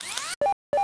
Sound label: Button Select; interface